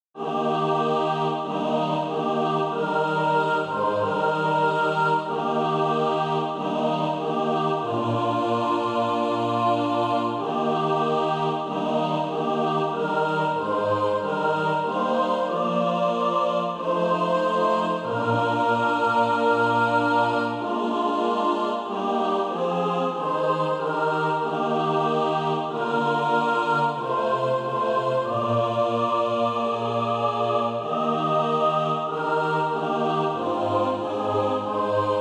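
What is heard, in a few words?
mixed, SATB Practise Music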